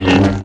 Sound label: lt saber swing; Kings